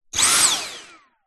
household vacuum cleaner dyson v7 stick short burst close up motor